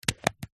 household nicotine spray dispenser child lock unlock